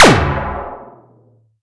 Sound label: laser; Lephisto